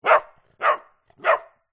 animals dog puppy several weeks old barking ridgeback cross bullmastiff